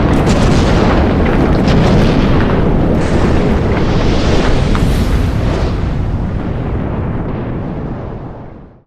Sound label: volcano eruption